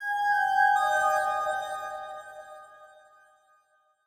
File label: Windows Shutdown; GMM Windows 10